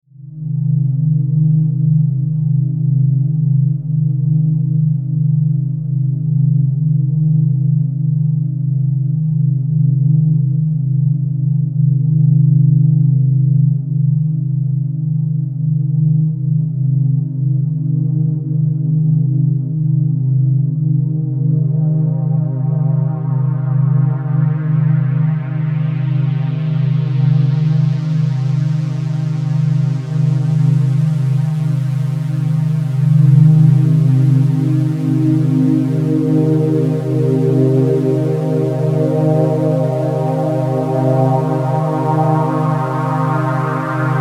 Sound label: Bed Filter Phase Wash; Bed Sound Effects; Blastwave; Production Element Imaging Element Accent Transition